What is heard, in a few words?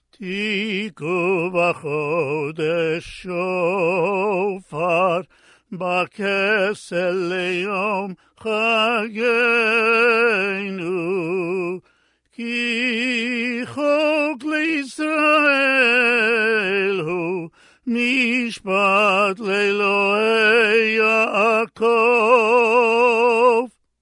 Tiku Vachodesh Shofar